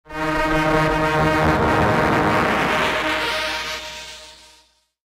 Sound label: Alien Com